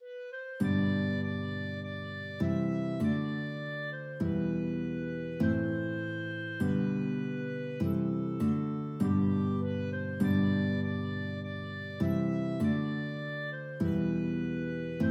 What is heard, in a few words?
guitar clarinet duet